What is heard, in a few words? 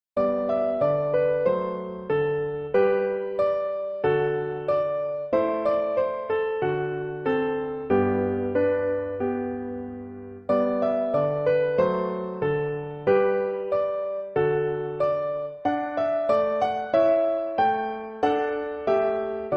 Accompaniment Track